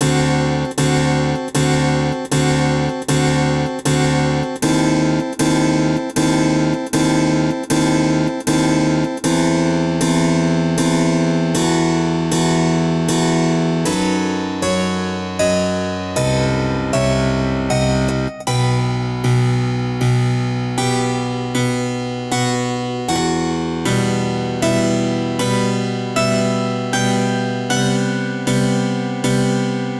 Accompaniment, Western Instrumental Classical Trio